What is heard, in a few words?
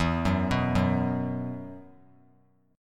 E Suspended 2nd, down; Esus2 arpeggiated